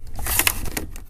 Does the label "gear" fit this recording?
Yes